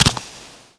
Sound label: land